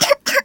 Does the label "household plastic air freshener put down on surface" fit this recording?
No